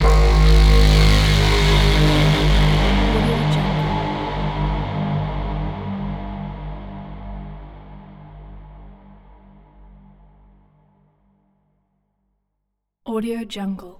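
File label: cinematic mega horns; soundmorph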